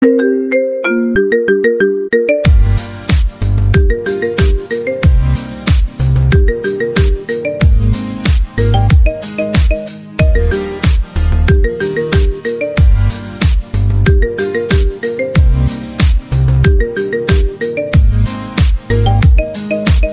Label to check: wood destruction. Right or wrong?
Wrong